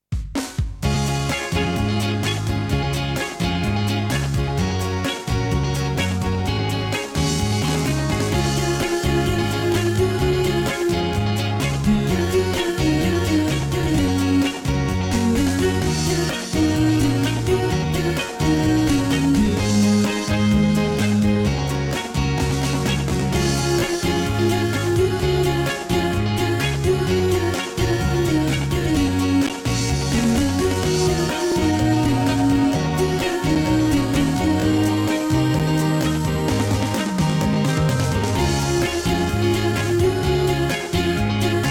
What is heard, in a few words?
music